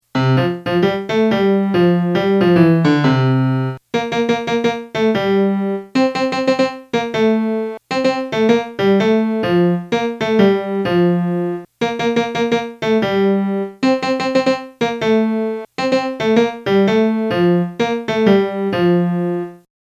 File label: morosa campagnola la melody